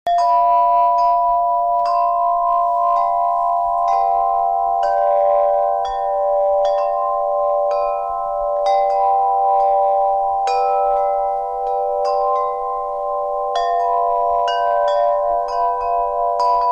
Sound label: Himalayan Echo Windchime